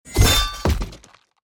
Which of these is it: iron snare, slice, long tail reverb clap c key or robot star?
slice